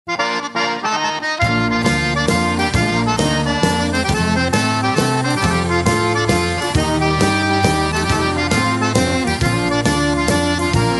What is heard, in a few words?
waltz